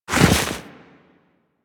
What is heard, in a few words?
Walk Deep Snow, Footsteps; Warframe; Operator